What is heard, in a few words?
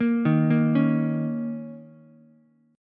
A sharp Minor Double Flat 5th A sharp, Ukulele; A#mbb5 arpeggiated; JGuitar